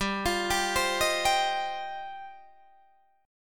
G 7th Suspended 4th Sharp 5th G down Guitar Open D